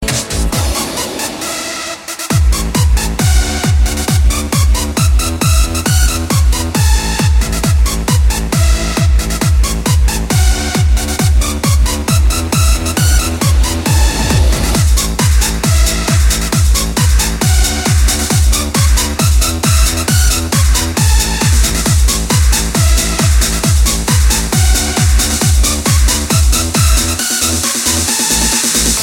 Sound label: jingle bells dance; ringtones